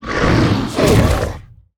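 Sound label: Wooden Beast attack; SwampOfDarkness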